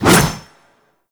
Reaper Melee Whoosh; Warframe; Wraith

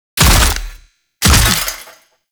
finisher foley; Enemies; Warframe; Duviri; Dax

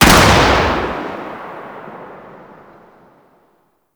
Explosion Short Burst; Warframe

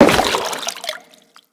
Crewman Step Water, Footsteps; Enemies; Corpus

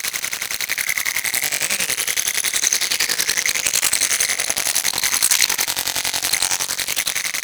Ambient Tech Drone; Warframe; Tau; Ambience

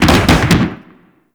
Slide Boost Backfire, Motorcycle; Warframe; Vehicles; Motorcycle Ninety Nine

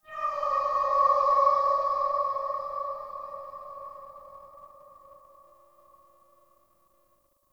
Sentient Lair Spirit Chatter Three E; Warframe

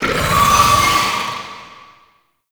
Summon Vocal Verb; Warframe; Uriel; Gulphagor

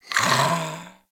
Puppy Sleep Idle Snore; Vocals; Warframe; Kubrow

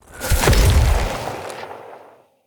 Corruption Wave Blast; Enemy; Lady Deora; Abilities

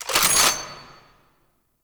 Assist Rechamber; gameplay; Warframe; Nineteen Ninety Nine; Quincy

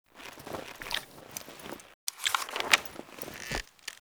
Turn Page; Tau; Protoframe Room; Roathe

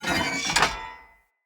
Light Mirror Move; Puzzles

